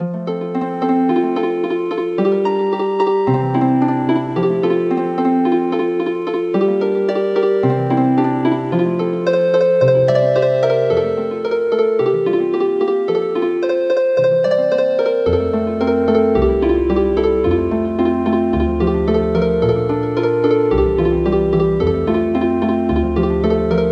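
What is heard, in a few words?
Healing Harp, traditional; El Rotlleto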